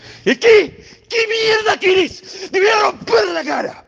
rage

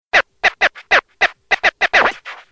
scratch

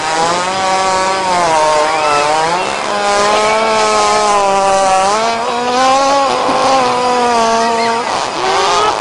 nuclear fart sound

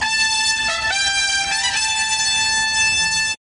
trumpeting

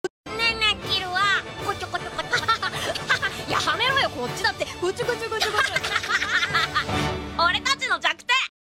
giggling; gon

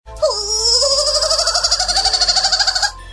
mousy chirp

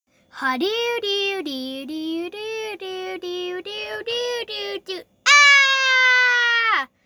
scream

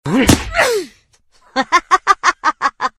jumpscare; sister madeline evil nun 2

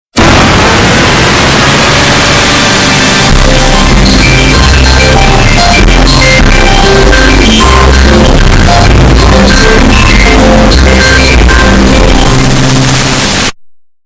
windows nt 5 0 startup earrape

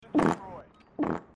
fart

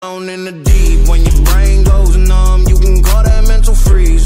bass boosted

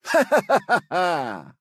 ha ha ha; lancelot

